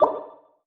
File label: Tab Button; D4DJ Groovy Mix; Android, iOS, Mobile